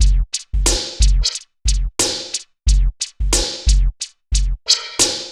Synth Loop, BPM 90

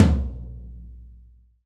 TOM TOM

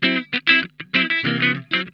SLINKIN, Funk Guitar; Zero G - Funk Guitar

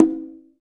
Congas; Roland BK-5; Percussion

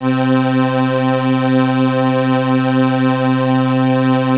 Crumar; Masterbits - Vintage Voltage; CRUMAR PERF

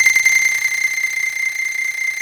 KOTO C5

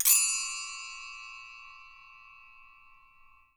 Percussion & Harp; Miroslav Vitous

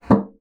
bassoon slap; Xsample VOL-6; C BASSOON

